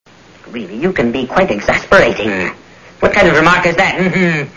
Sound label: mmhmm